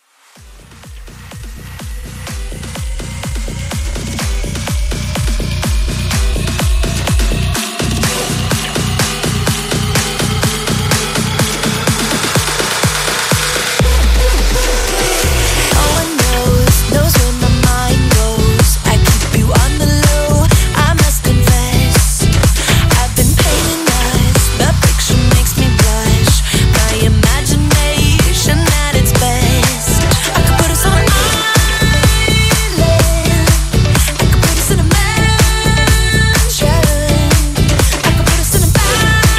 Pop Bootleg MixShow 125 BPM

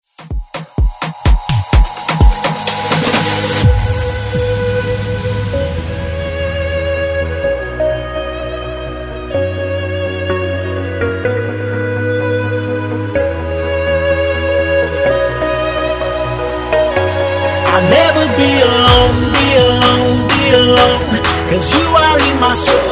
Afrobeat Redrum; remixplanet

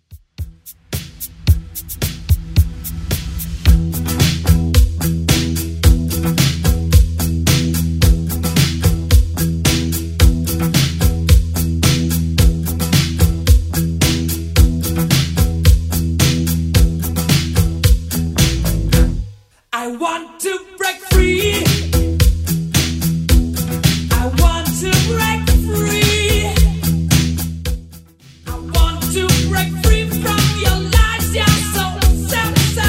80s Rock Redrum 110bpm; DJ Friendly

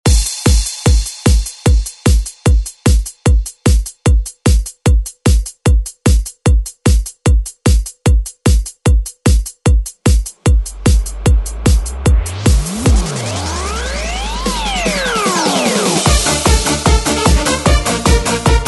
90s ReDrum; DJ Mhark

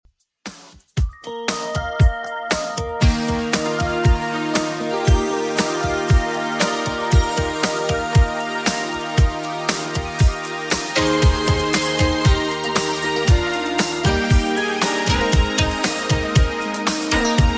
80s Redrum 117bpm

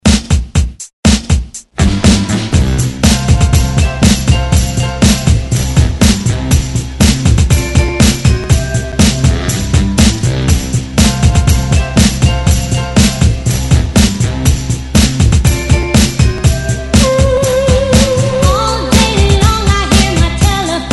60s ReDrum 121 Bpm

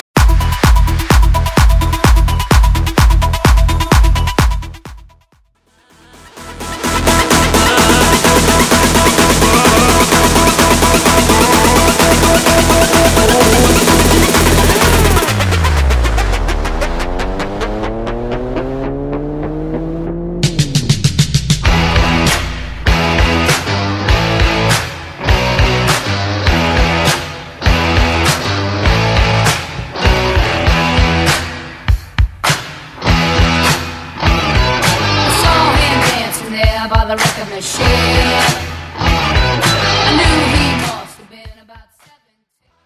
80s Rock Future House

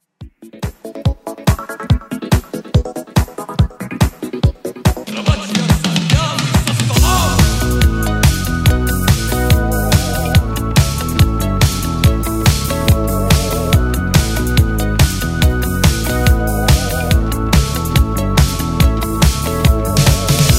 80s Redrum 142 bpm

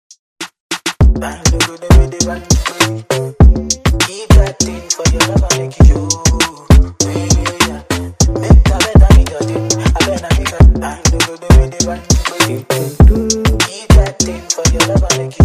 Afro Top40

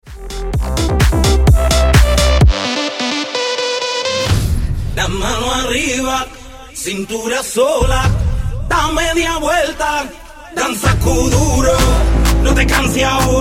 128 bpm

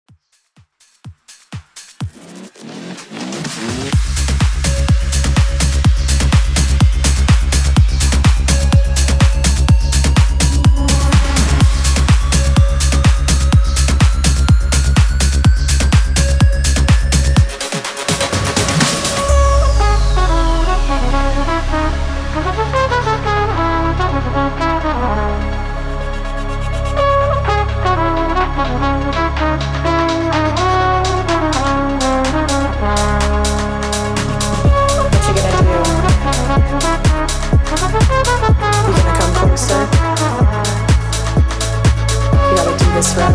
125 BPM

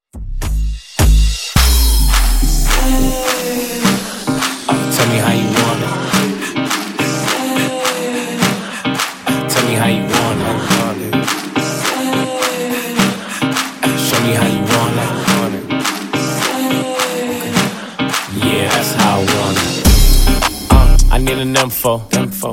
Funk Intro Outro 105Bpm

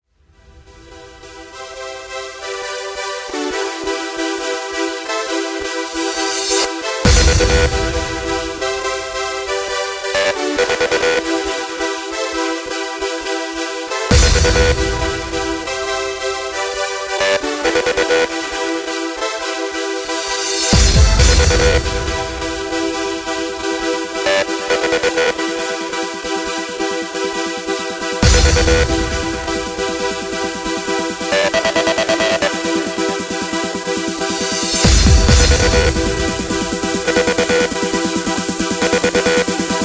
house festival, 136 bpm

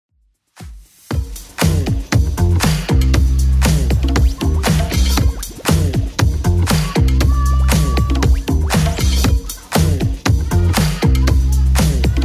80s Club Edit 118bpm; remixplanet

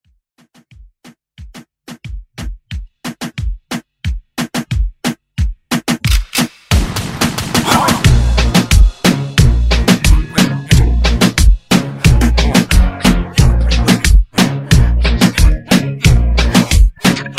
Reggaeton; Redrum

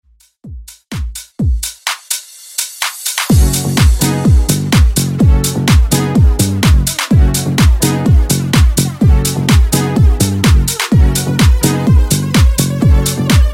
80s Tech House

